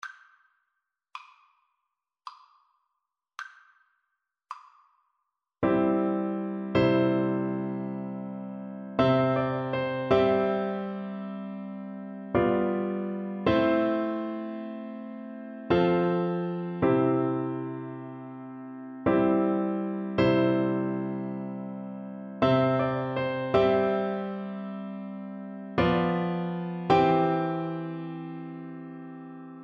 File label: amazing grace, Alto Recorder